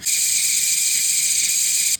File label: hiss, scratch wax